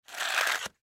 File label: Cell Drag, Cell Telephone Opened Drag Cement; Technology; Cell Phones; Blastwave